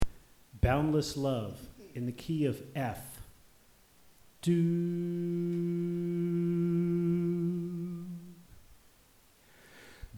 Soprano

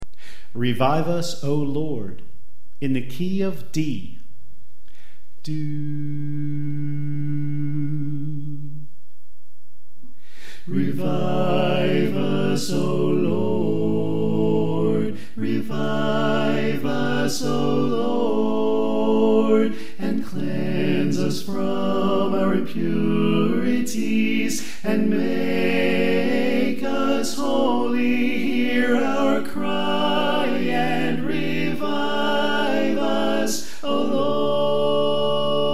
Soprano Left

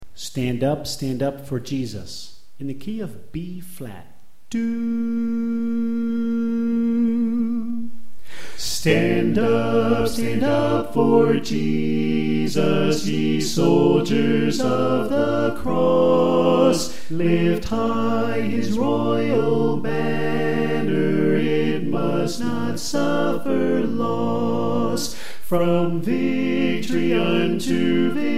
Bass Left

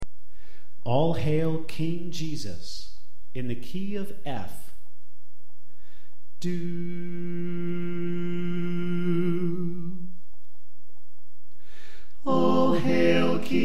Soprano Left

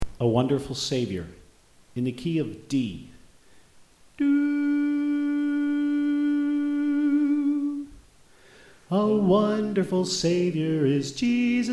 Soprano; A Wonderful Savior

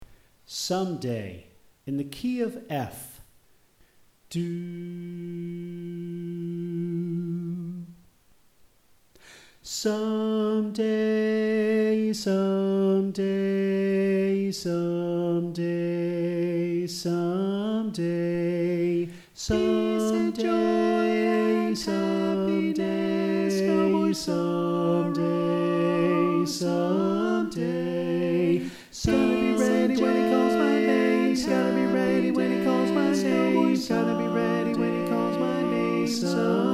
Alto Left

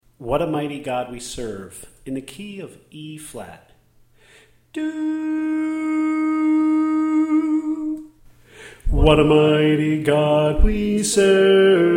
Bass

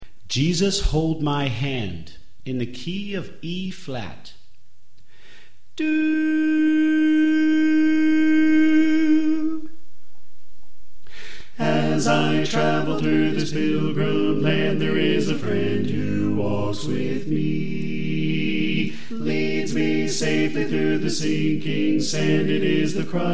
Bass Left

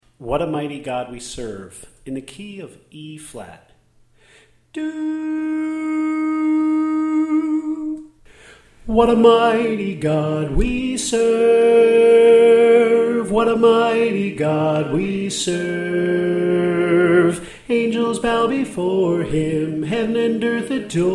Soprano

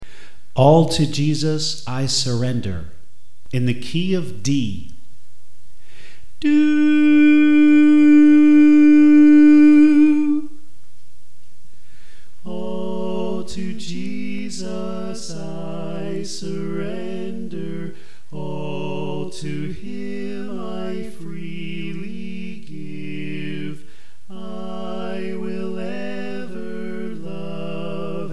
Alto; All To Jesus I Surrender

